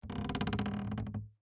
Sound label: tree; ambient; Counter Strike Model Pack; CS:GO; survival